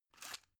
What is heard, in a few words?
drug blister pull out smooth; berufe; arzt